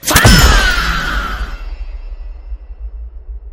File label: melee parry success; alternating fahh parry sounds